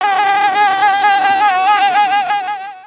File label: Jungle Insects; gnarled forest